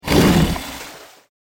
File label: crocodile bite; crossyroad; dinosaurs